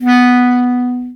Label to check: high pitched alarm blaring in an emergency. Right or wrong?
Wrong